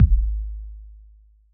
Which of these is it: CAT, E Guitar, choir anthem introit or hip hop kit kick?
hip hop kit kick